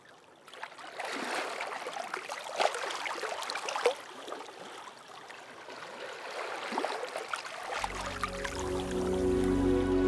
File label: Ambient Waters